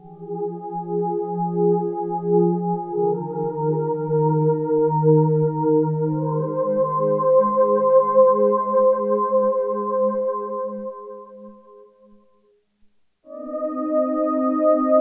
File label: Voices, Roland JX-8P